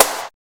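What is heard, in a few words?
FUTURE SNARE; Drums In Your FACE!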